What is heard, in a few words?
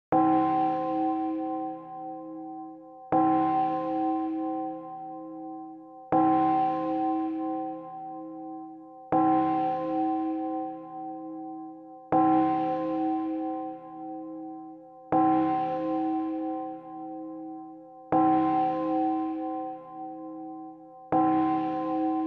Chiming clock; watches